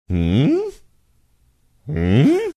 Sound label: hmmm hhmmm h